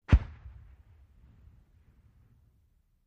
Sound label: Mortar: 60 Mm Mortar Fires, Distant